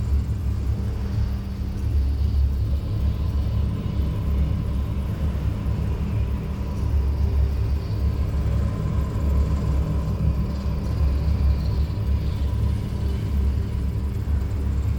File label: rift crystal idle; astral planes